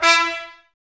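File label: Brass trumpets E, Mute; Miroslav Vitous - Woodwind Brass Ensemble; Tps Mute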